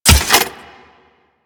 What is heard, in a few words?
Crutch Step Dirt; Warframe; Grineer Ghouls; Ghoul Drill Sergeant; Foley; Footsteps